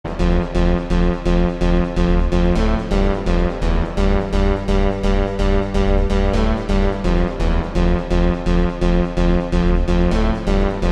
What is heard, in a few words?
best swedish one ringtone